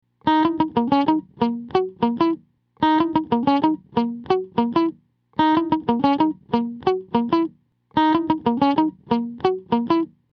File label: clean middle; Clean, minimal compression, middle pickup